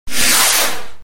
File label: rocket